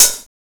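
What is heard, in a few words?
OHH, open hi-hat; X-Static Goldmine 1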